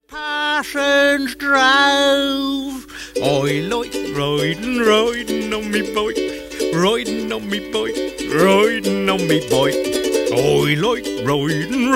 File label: Fen Bike Song